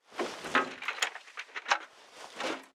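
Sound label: Weapon launcher jam; rockitlauncher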